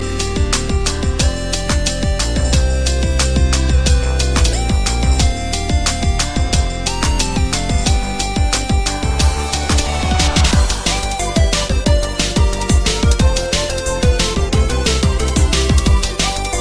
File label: 8 bit